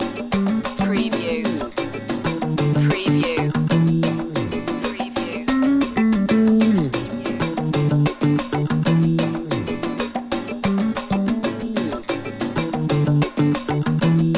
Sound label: muito bunito loop